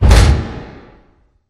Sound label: pod doors open start; Titanfall 2